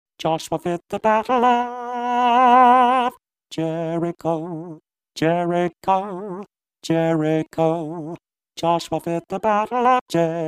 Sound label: Jericho F section tenor lowered